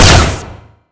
blaster shot; GoldSrc

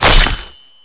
axe cleave flesh; GoldSrc

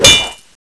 sword world; GoldSrc

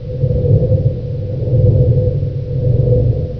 fan, ambience; GoldSrc

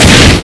flamethrower start; GoldSrc

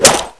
sword flesh; GoldSrc

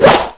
sword swing; GoldSrc

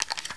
reload, weapons; GoldSrc

